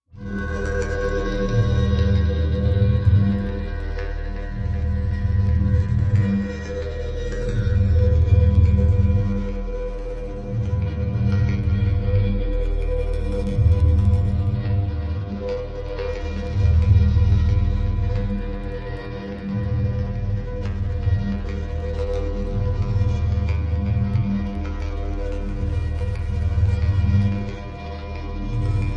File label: Deep Rooted Machine, eerie ambience